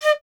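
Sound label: FLUTE PICCOLO D; Miroslav Vitous - Solo Instruments VOL-2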